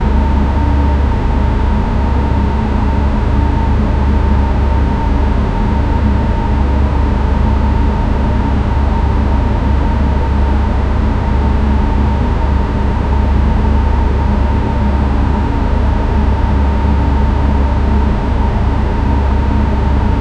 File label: room ambience with fan